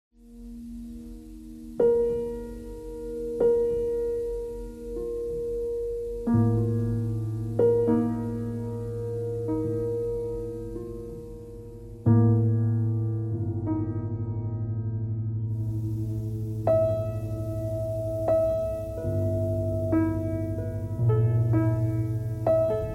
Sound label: piano noise